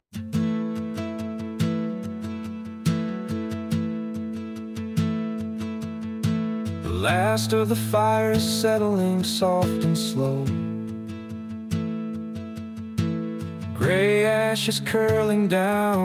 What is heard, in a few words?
lullaby, name songs; cleo